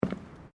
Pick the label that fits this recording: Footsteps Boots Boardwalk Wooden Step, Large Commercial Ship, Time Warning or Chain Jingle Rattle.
Footsteps Boots Boardwalk Wooden Step